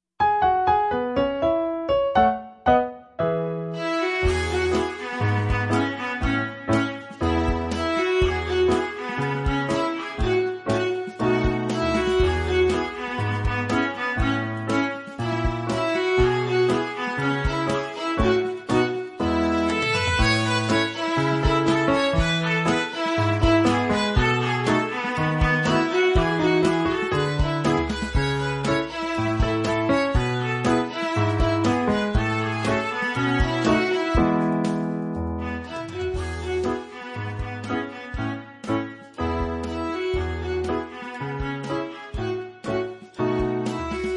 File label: Ragtime, viola